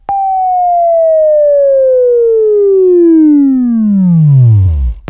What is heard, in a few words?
Buzz, Left